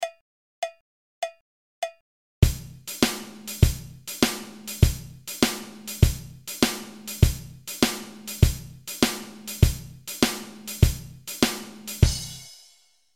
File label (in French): shuffle a eviter